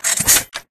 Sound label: reload, weapons; bison; video games; source engine; tf